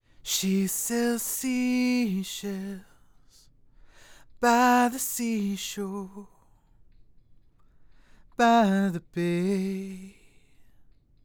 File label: Tube Acapella; Mic-Mod Madness